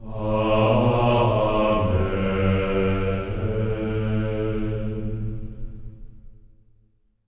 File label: AMEN A3, Symphony of Voices; Spectrasonics; GR AMEN